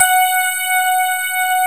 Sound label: GRAND PF F#4, AKAI S-Series; AKAI S-Series CD-ROM Sound Library VOL-7; D.GRAND PF